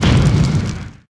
skill explo; SkillSound

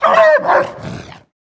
Mob die; Monster; 흑수사; 흑정랑

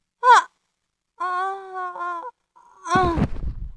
Mob die; 금전방; 동전당주

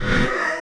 run, Monster; 흑수사; 법왕원소

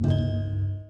Quest Find

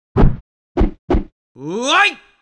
m shout, Battle, Character

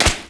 leather bash wood

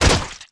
Wood Light Bash; Monster

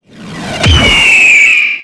skill shot fire

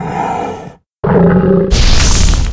mob raid die; Monster; 레이드; 백호왕

